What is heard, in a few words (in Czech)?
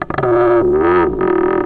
vrz; Mafie 1